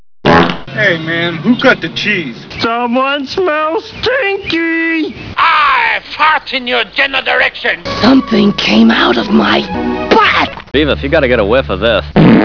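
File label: stinky, fart noise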